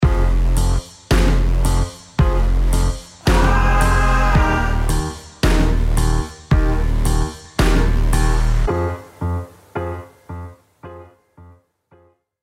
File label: lost round, music